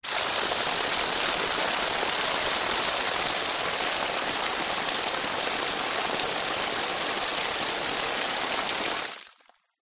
nature water pour grass mud heavy flow

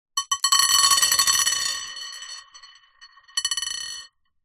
leisure plastic toy bingo balls drop plate bounce; zapsplat

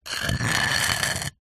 wood pole scrape hard ground; foley; zapsplat

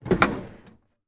household bin trash under sink slide out open; zapsplat

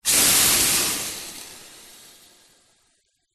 household saucepan hot under water sizzle steam

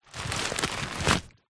foley paper bag handle movement scrunch

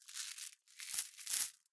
Chains Drops; Just Chains; soundbits

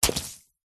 sponge wet hit squelch; foley; zapsplat

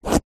carrot slice knife; zapsplat

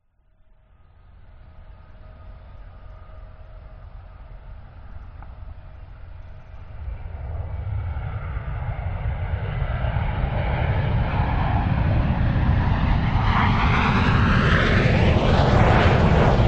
vehicles aircraft private jet land distance

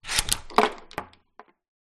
household door chain slide unlock swing hit wall

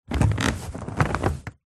household mattress old springs squeaky movement; zapsplat

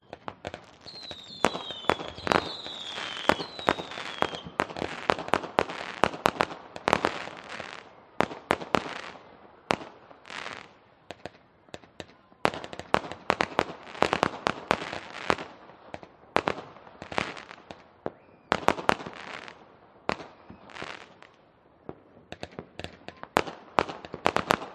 various fireworks distant crowds

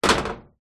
impacts metal rod hit metal door; zapsplat

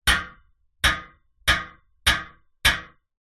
impacts metal frame tube light x5

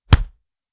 Fist Punch No Vocal; noise creations

